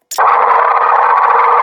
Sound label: roller mine taunt; hl2